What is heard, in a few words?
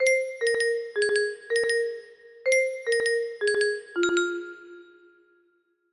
bg melody, music box melody